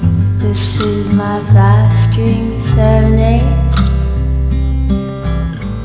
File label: 5 string